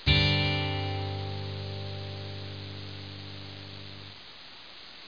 riff

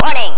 warning

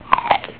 Chomp; After Dark; Chomp 2.0

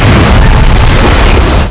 CRUNCH; Driver; CD Expert 39

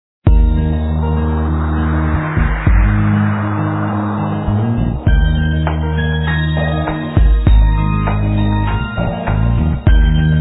music bg; magic heaven

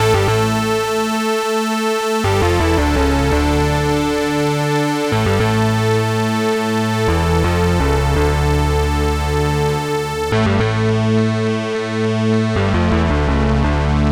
Open Credits; galactic empires

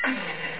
ss object hit; lem revenge

